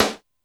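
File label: Snare Clik Clak, Alesis D4 drum machine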